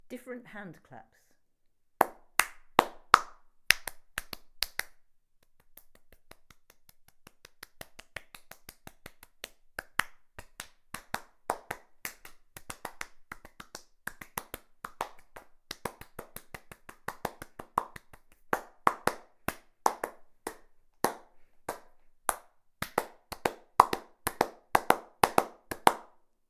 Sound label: Different hand claps